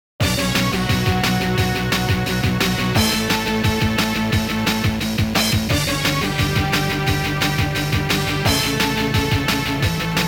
Completion; Fuu-un Super Tag Battle

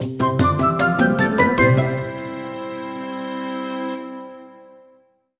Minigame Birds Win

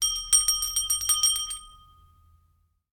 Hand Bell; the 39 steps

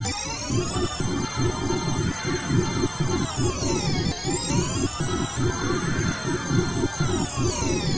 Flesh Tunnels - Ambient

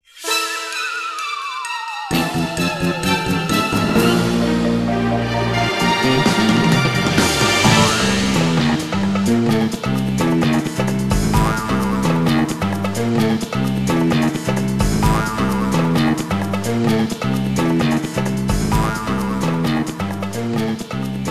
battle intro loop; Yo-Kai Watch 3; 3DS

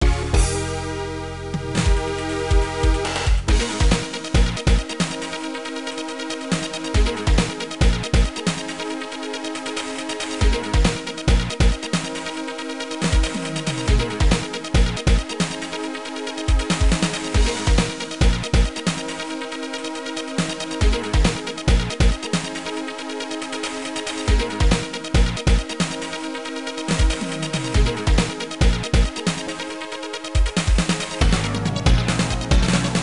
Ground Rhythm; Cyber Sled; namco system 21; arcade

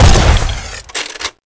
SNIPER; MECH

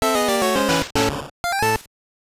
Jingle; Monster Race

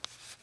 Notebook SelectPaper

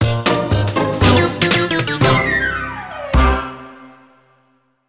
Race Lose Fanfare

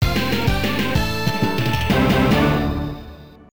Set Clear; Windjammers; arcade

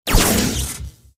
Ufo Laser Shoot

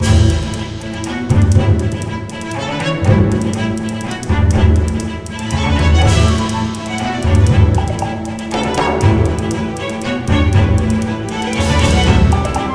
race; bella sara the magical horse adventures 3ds gamerip 2012

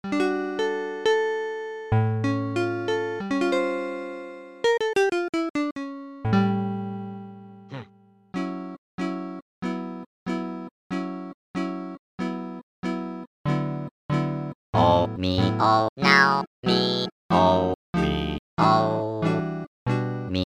Swing (LIVE); animal crossing full gamerip

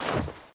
Foot Deep Snow Run

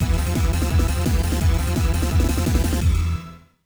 Stage Clear, Game Over

